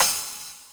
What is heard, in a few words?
CYMBAL, Yamaha RM 50